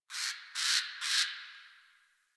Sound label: Tree Critters Happy Calm; Ambience; Props